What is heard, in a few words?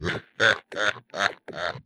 skeleton laugh; Various Evil Sounds